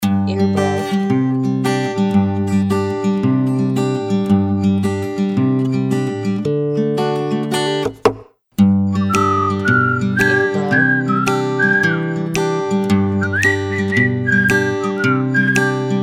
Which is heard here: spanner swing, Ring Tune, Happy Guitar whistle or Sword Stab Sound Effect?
Happy Guitar whistle